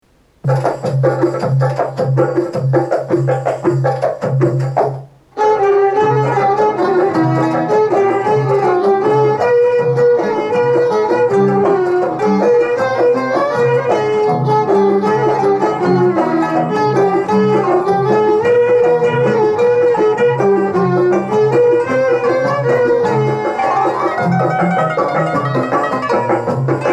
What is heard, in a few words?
Instrumental